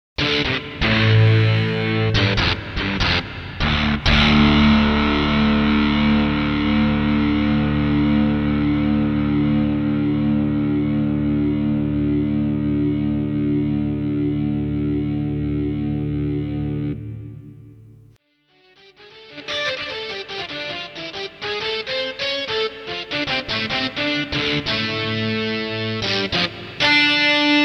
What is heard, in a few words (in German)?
E GITARREN SOUNDS